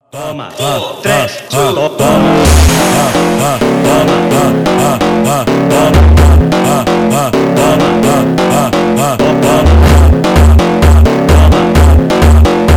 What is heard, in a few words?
phonk